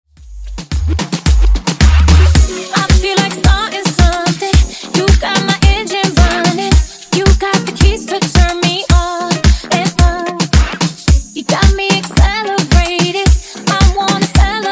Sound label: Funk, 110Bpm; MashUp